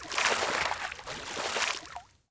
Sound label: wash